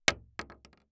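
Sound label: Weapon Bullet Shell 12 gauge Drop On Solid Wood; AdobeCC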